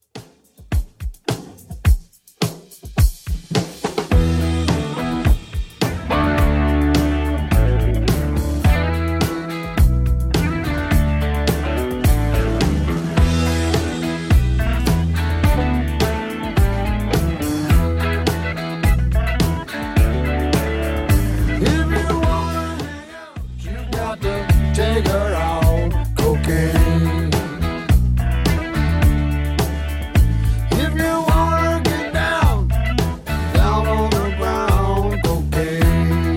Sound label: 70s Rock 106 bpm; Redrum